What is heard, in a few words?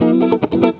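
JAZZ GUIT GROOVE; Best Service; World Colours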